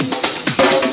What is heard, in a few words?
Minimal Fills; Minimal Loops